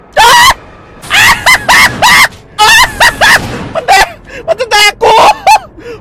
scream sound effect

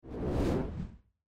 swinging sound effect

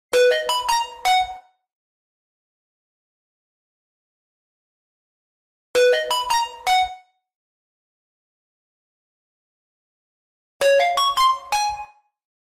Android notification sounds

